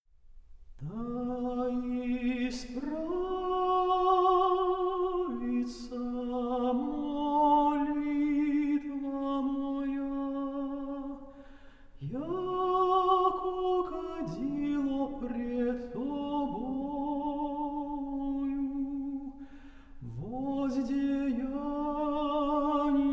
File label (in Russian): Хор Валаамского монастыря